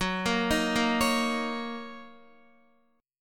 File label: Fsharp 6th down Guitar; F#6; arpeggiated